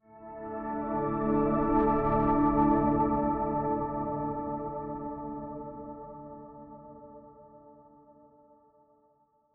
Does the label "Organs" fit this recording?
Yes